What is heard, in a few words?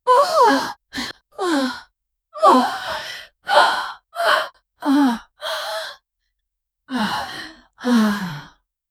aggressive demonic female